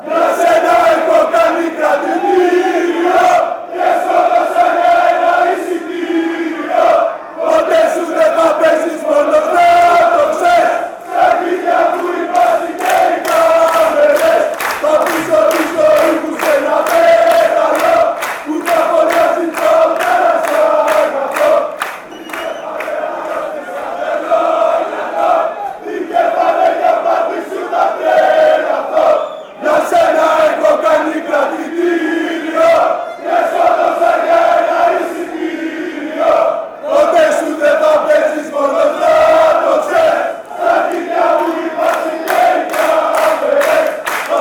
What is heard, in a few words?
paok fc chant